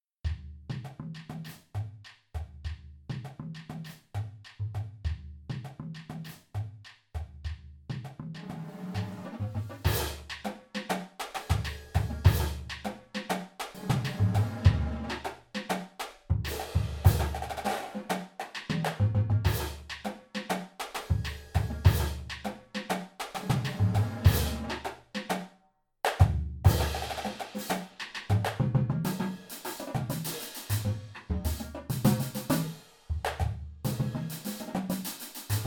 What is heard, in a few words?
Drumline Cadences